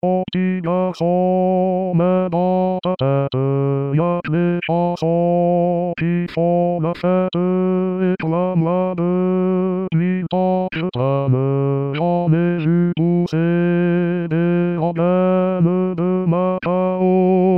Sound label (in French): mon p'tit garçon refrain hommes